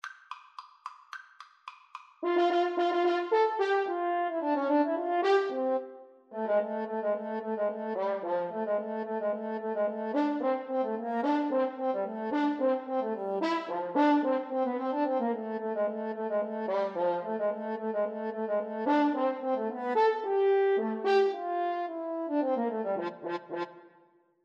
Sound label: radetsky march, French Horn Duet